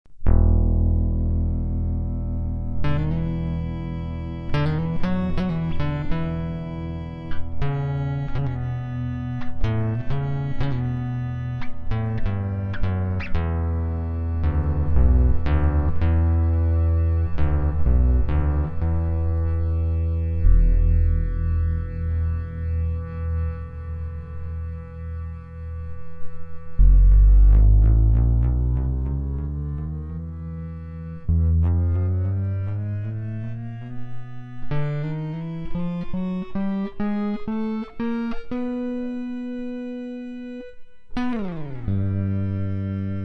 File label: MIDI BASS; K-MAX